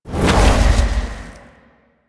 Void Dragon Orb Return; Duviri; Abilities

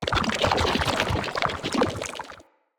Corrupted Slug Foley Move; Creatures; Movement

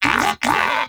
Moa Pet Agree; Pets; Warframe; Tenacious

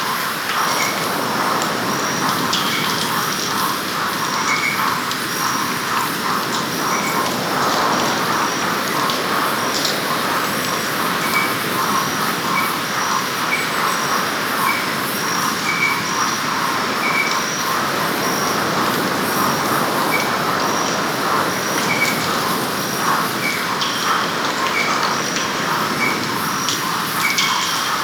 Forest Exterior Night Calm Amb; Warframe; Ambience; Grineer Forest

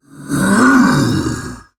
Attack Effort; Warframe; Dax Heavy; Battle Barks

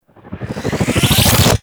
Spawn Drop; Warframe; Corpus; Arachnoid Wraith

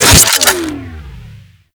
Microwave Pistol Stop; Warframe; Grineer

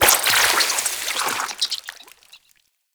Infested Flower Burst; Warframe; Ambience; NineteenNinetyNine

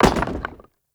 Debris Metal Stone; Warframe; Entrati; Ambience

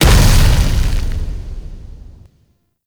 Hazard Pyro Start; Gameplay; Stadium; Warframe; Nineteen Ninety Nine

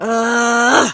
Throw, Barks; Kuva Lich; Persona B; Ability

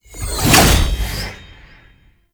Heavy Orbital Strike Cast Plant; Abilities; Warframe; Tau; Dax Heavy

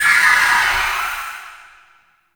Blade And Whip Heavy Vocal; melee; weapons; Warframe; Dagath Blade And Whip

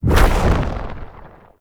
Laser Player Impact; Enemy; Characters; Abilities; Mendicant; Mnd King

